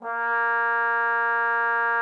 trombone A#3; Xsample VOL-3

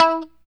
POP E 4, STRAT guitar; Spectrasonics; Hans Zimmer Guitars VOL-1; JC-STRAT POP

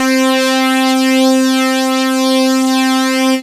Synths Note C; Best Service; House Definition VOL-1

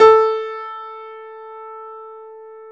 PIA HIGH G, Grand; Sound & Vision; Gigapack I CD 2; PIA GRAND 1

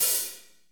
HAT, Roland Fast Rock Hi-Hats; Northstar; Drumscapes Roland

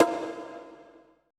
CONGA R; BPC DRUMS

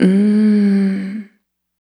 Single Voice Melodic Loops; Singles

